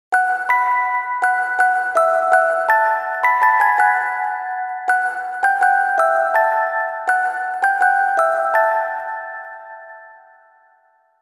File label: Music Box; Grimoire Song; Rune Factory Frontier